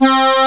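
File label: accordion; gmplay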